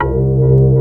OB, OB modular; Greytsounds VOL-1

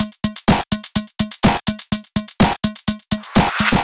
125 No Bd, Drum Loops 125bpm; Zero-G; Soundclash Nu Dance Electro; Acidized Wavs; Sticky Icky

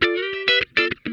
FUNKIN, Funk Guitar; 90 s Sample CDs; Zero G

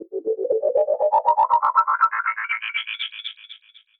SFX, Trance NRG

